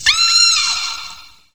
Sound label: graveyard screech; tour graveyard